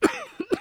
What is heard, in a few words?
gene therapy inject